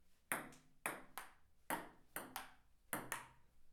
Table tennis

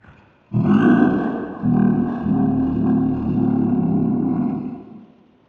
Dragon Roar

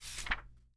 Book Page

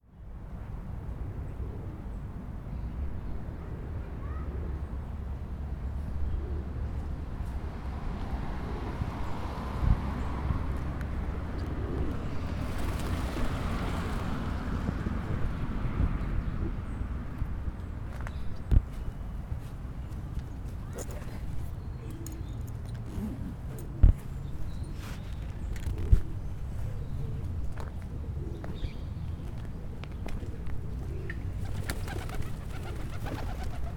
Pigeons Footsteps

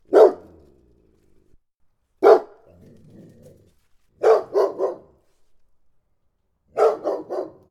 Labrador Dog Barks

